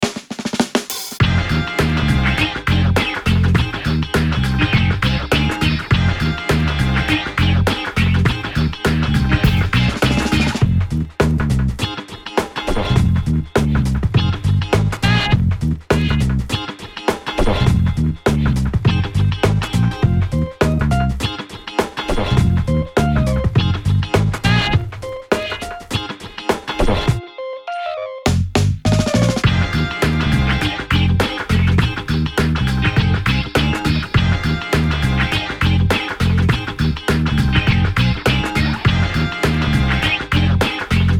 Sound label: hip hop the funk